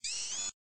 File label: mechanism up; Oppo; A5s; ui